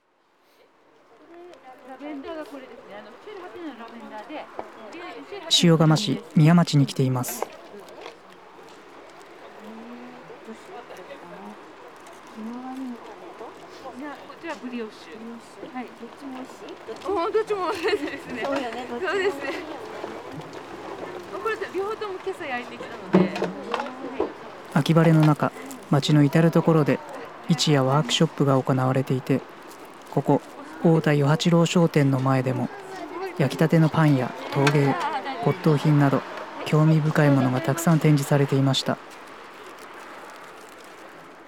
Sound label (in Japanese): もろみが醸し出す音ナレーション入り